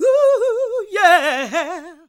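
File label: Gospel; Best Service Gigapack 1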